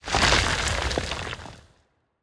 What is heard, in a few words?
Debris